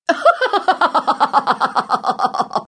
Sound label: LAUGH; BattleSNDS